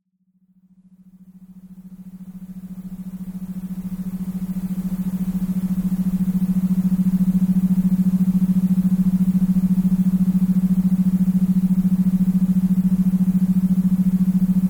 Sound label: Improve Reading Isochronic Grey Noise